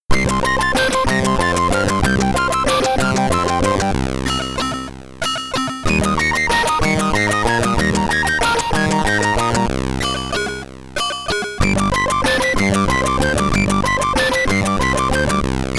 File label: Victory!